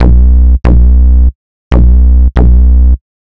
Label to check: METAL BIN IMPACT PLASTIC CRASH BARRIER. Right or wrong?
Wrong